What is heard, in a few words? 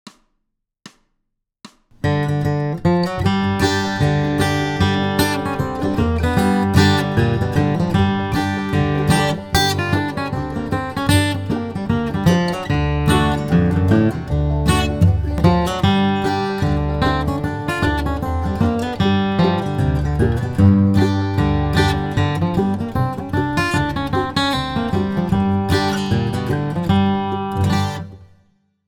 blues solo